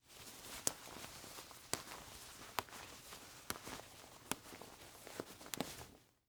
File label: Short Sleeve Snaps, Clothes, Shirt, Unbutton; Foley; Dressing; BlastWave